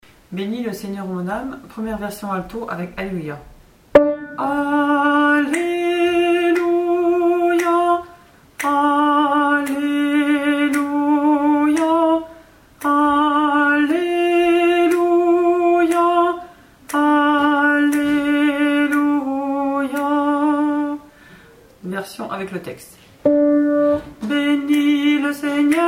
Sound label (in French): Bénis le seigneur ô mon âme ALTO